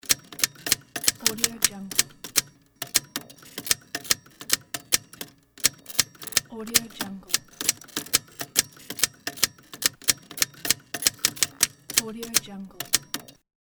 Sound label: typewriter